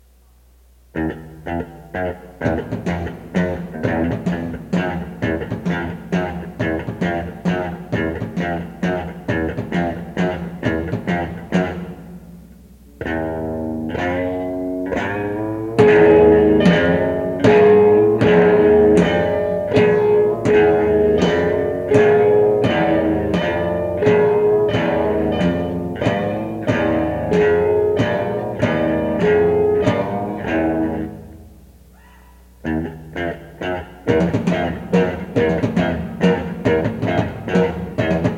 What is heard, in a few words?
plays Two Guitars